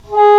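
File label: English Horn mf G#2; Digital Sound Factory Virtuoso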